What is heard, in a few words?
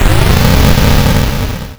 gunner dash